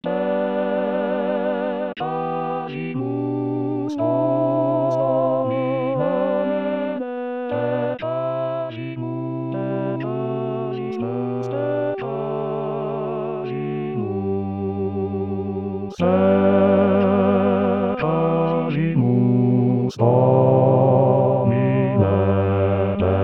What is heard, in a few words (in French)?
Basse2 renforcé; Carissimi; Jonas